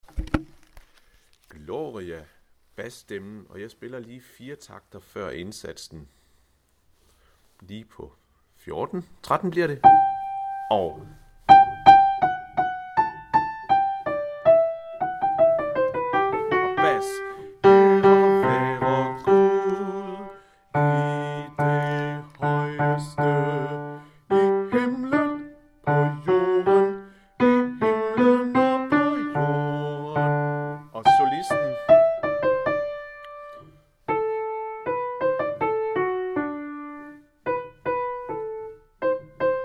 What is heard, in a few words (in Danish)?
bas, harlev koret; Gloria